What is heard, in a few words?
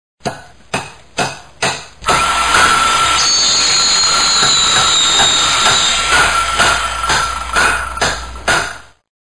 carpentry